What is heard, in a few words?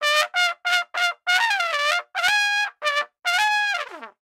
Trumpet; Goomba